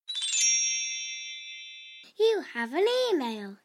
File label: tink email